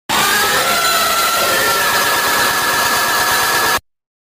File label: jumpscare sound effect; sonic exe 2011x